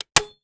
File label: Staple Gun into Wood; Tools